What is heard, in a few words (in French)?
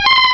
Cri